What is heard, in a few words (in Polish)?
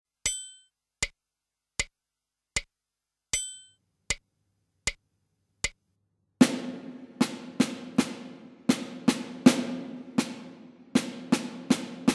dyktando rytmiczne